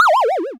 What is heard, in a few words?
disappear